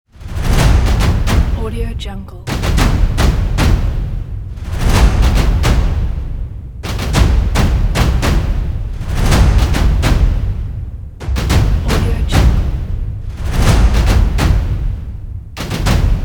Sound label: percussion